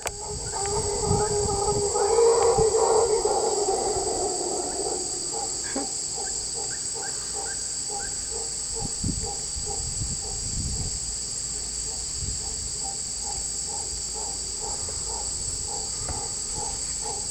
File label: howler bark; Howler monkeys (barking); Panama